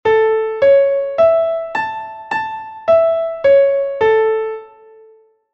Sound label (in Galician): É un arpexio dunha tonalidade; apexio la M